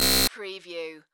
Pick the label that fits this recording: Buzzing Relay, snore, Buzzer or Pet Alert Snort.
Buzzer